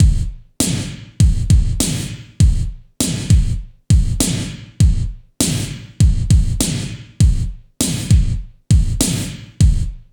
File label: ROCK; INIS